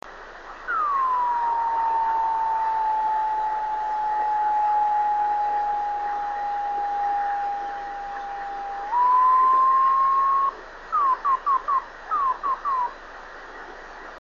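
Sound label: drift net beacons; 28.131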